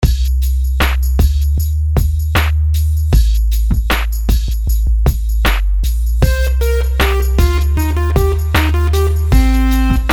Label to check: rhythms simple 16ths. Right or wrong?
Right